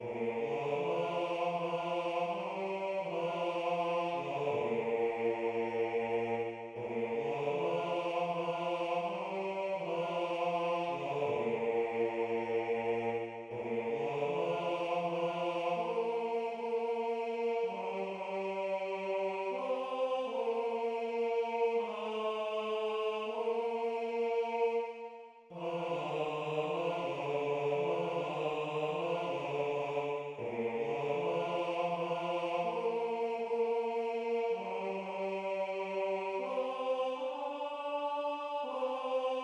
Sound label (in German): Gesangklang